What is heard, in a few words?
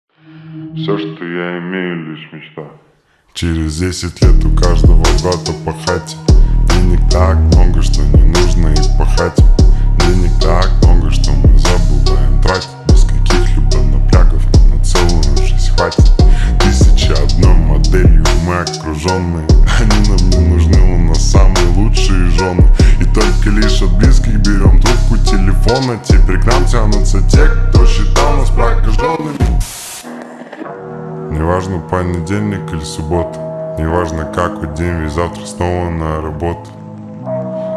slowed reverb